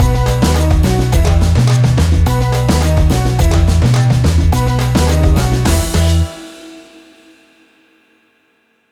African Reggae Fusion Stinger

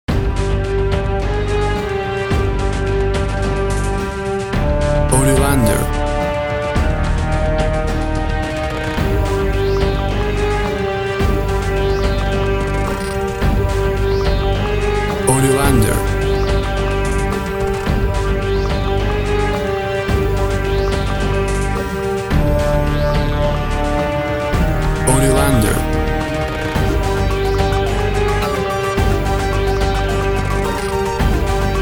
Uptempo Smashing Hybrid Action

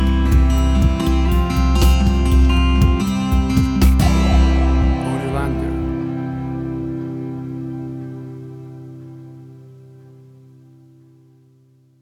Acoustic Mystery Stinger

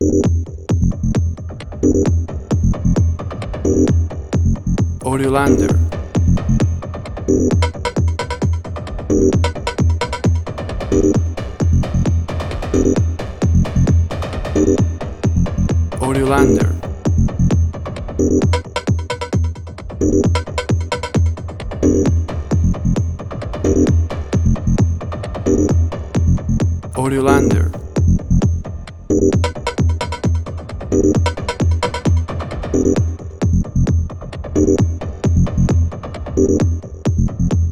trance emotion

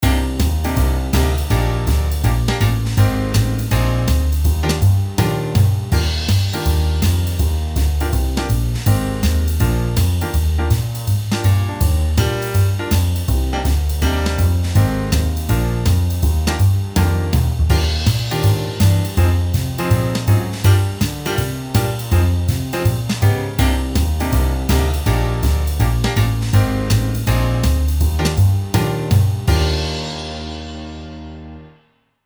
Toe Swinging Jazz Music

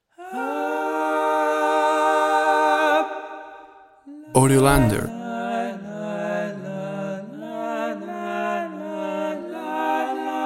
Wales National Anthem Vocal Arrangement

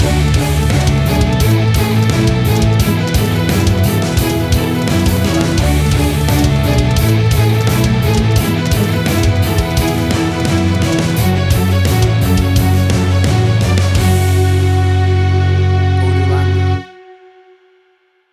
Big Action Gothic Metal

